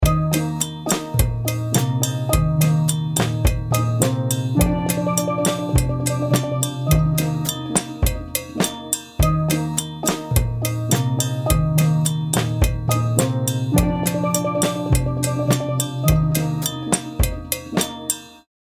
Steel drum ensemble

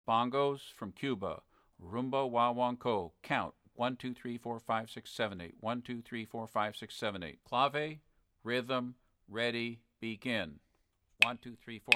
Rumba guancaro

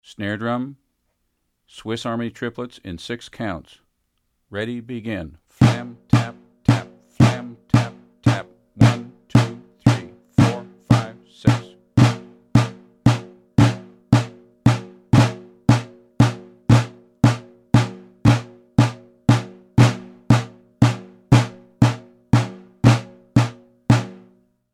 Swiss Army Triplets

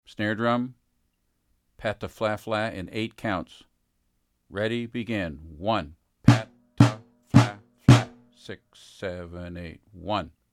Pataflafla

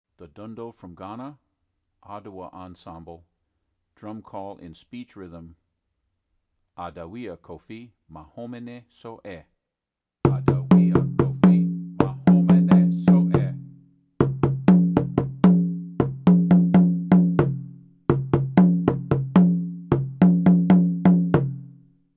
Atumpan speech

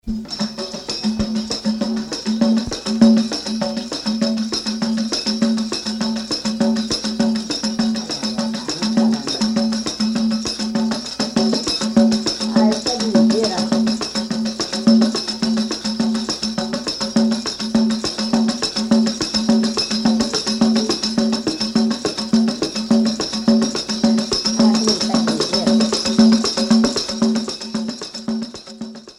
Pandeiro solo

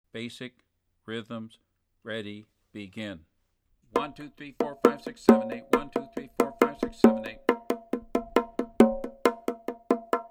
Bongos Martillo

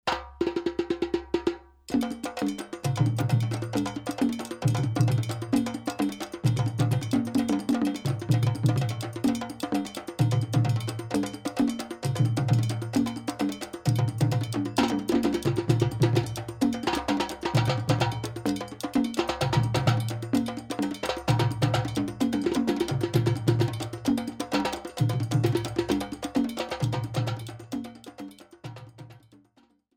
Djembe Ensemble

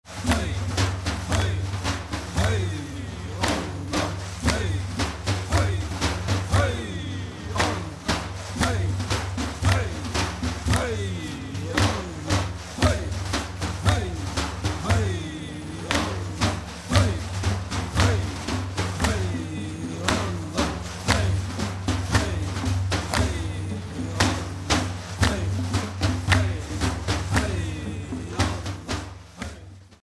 Daf with singers

